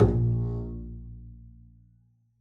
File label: pizz bb1, double bass; dsmolken